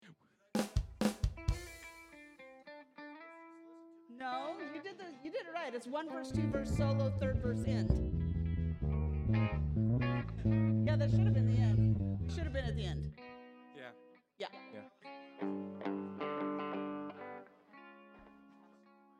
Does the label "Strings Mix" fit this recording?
Yes